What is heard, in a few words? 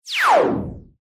Laser Wipe; Laser, Warble; Lasers